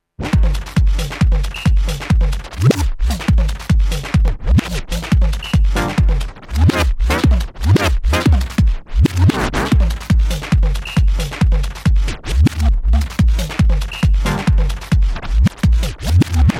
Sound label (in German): Scratch Spulen im Song; Serato